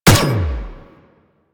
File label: Energy Rifle Shot; Warframe; Weapons